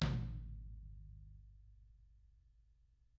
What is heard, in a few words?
TAMA TOM HIT; ILIO; Double Platinum Drums 1